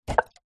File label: food drink cola can full open pick up; zapsplat